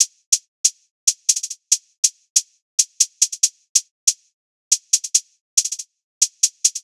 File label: Drums 140bpm Trap Drill